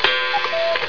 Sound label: Cuckoo